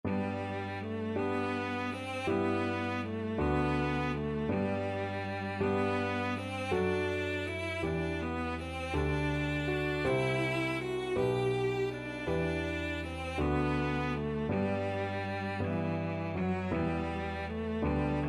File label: begotten, cello